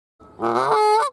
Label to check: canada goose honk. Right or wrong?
Right